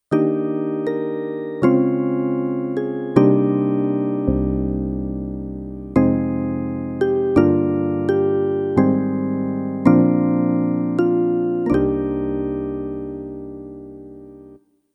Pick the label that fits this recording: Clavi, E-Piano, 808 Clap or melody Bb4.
E-Piano